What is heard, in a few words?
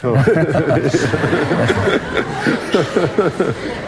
laugh audience; ooh ahh